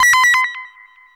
ALARM

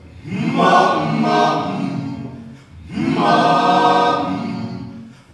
HUMA HUMM, CHOIRS; Spectrasonics; Heart of Africa

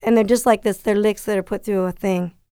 LICKS, R&B Dance; FEM.SPOKEN

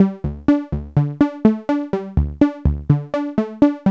Syrinx, L; AMG - Vince Clarke Lucky Bastard

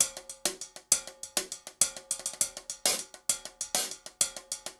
metal; Zero-G; Monster Beats

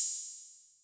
H Opn, Hi-Hat, Yamaha DTXpress

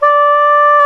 SAX SOP MP D, Soprano Sh

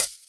Hhat, HiHat; Equipped Music; Loungin House

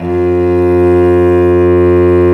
STR VC Arco Solo p

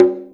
CONGA, Percussion; InVision Interactive; Percussion Slam; BOLERO

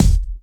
combi drums

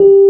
AMB ROAD; Keyboards Old School; USB Soundscan vol.09; AKAI

